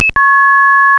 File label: coin return, tones; 2600; phreaks and geeks